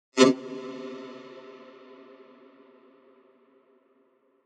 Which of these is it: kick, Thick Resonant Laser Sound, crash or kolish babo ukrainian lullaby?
Thick Resonant Laser Sound